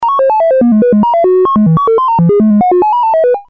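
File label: lfo random; Soft Random（S/H（サンプル＆ホールド）波形にポルタメントをかけたような波形）、Square（矩形波）、Triangle（三角波）、Sawtooth（下向きノコギリ波）、 Randomの5種類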